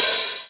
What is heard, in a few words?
normal hit whistle